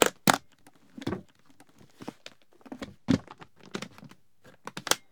Briefcase Open Close; Home & Office; 1001 Sound Effects

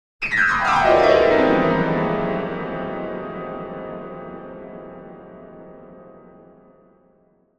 Piano Dramatic Descending Play; Hollywood Edge; sound designer's toolkit v2

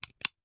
Hand Gun Foley; Sound Ideas; Guns

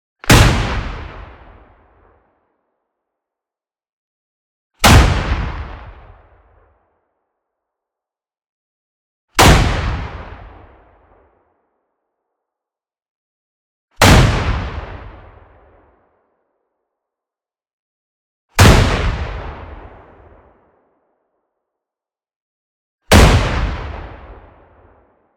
EXPLOSION SHORT Bang; boom library; Anime Essentials

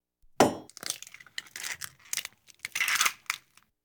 Household Phones

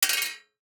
DICE on Metal, Throw and Roll, Mini, One Die; Samples; ArticulatedSounds; DICE Sfx Party (Extended)

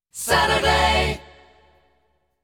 Saturday, jingles

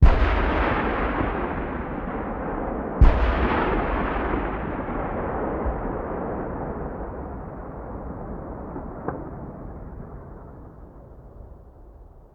Cannon Tank; Sound Ideas; Guns

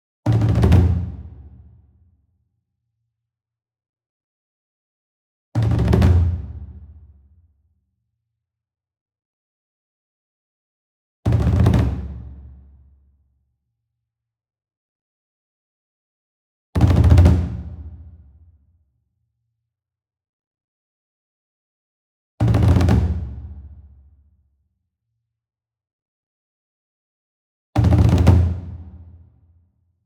ENSEMBLE DRUM Roll Medium; boom library; Cinematic Strikes Construction Kit

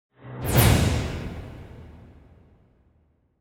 Symphonic Hit Short Finale - Double; Hollywood Edge; sound designer's toolkit v2

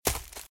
Footsteps - Leaves - Run - Right; RadwinIsland

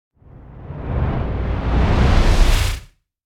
Whoosh Fat Storm - reversed; Hollywood Edge; sound designer's toolkit v2

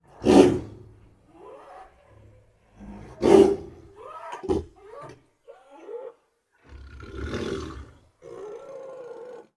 Angry, Abrupt, Throaty Breaths, Tigers; Sound Ideas; Tigers Sound Effects

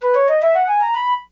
Flute Play Scale Up; SFX Kit; Foley - Misc

